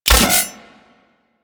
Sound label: Spin Attack Hit; Warframe; Corpus; Arachnoid Micro; Abilities